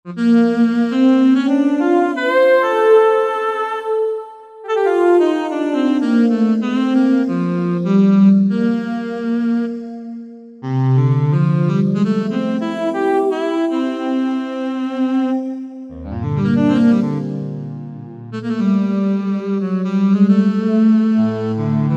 Pad, Korg Wavestation; Palo Alto Pad

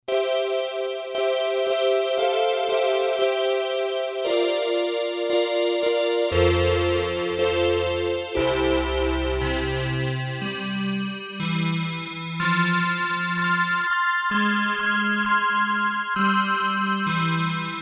Synth-Ens, Casio CSM-1; Tones

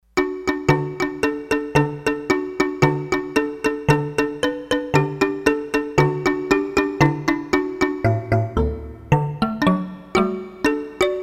Native Perc, Roland D-10; Factory Patches

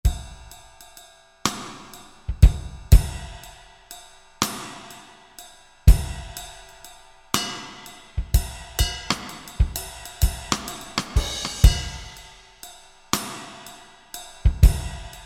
Roland SRX-01 Dynamic Drum Kits, Pwr Blld KIT

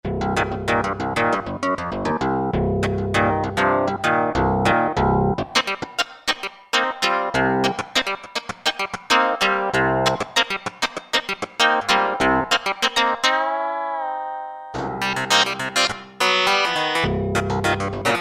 KY Modclav, Yamaha TG77; Factory Presets

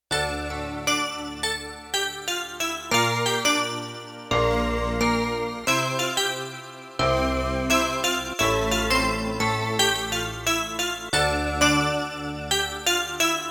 Belltones; Spacey Keys

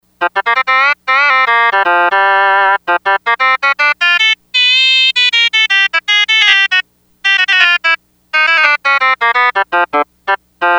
Lead Reed, Yamaha DX100; Factory Patches

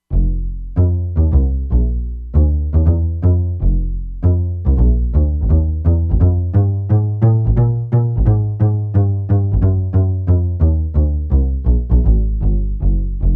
Jazz Bass; Factory Presets; E-mu Proteus-2

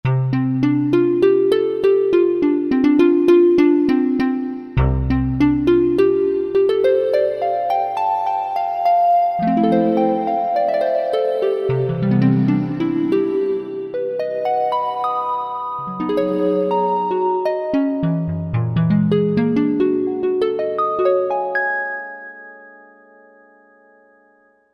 Digi Harp, Korg Wavestation A-D

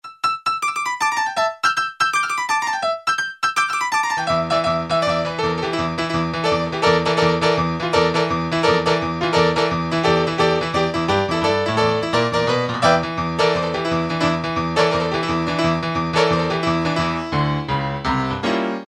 ff Piano, Roland U-110; Factory Patch

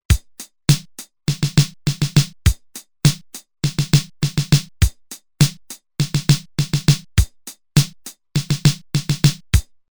Fill In 4-4, Roland TR-505; Factory Rhythms

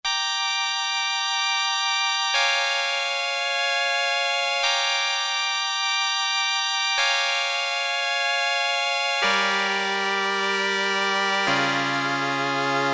SYN LYRA; Korg DSS-1; Harpsichord & Organ

